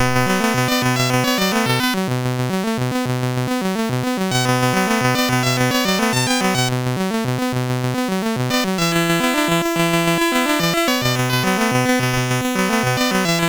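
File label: Running Man, MOS 6581 SID chip music, games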